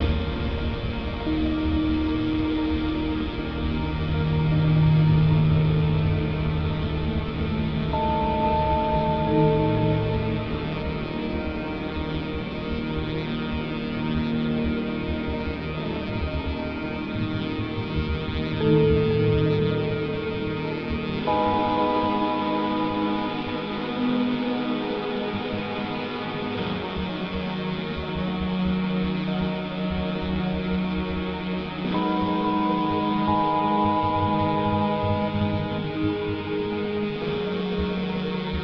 Mellotron Bells

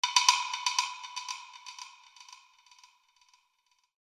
wood

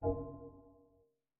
GUI Sharp Activate; The 39 Steps

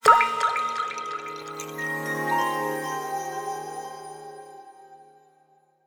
Calm Hit; super mario maker 2; Peaceful SFX Raws - Otoasobi

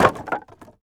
IMPACT Wood Plank On Wood Pile; mono

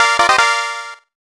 Treasure Chest Appears

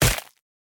Squished Crush; boomerang fu

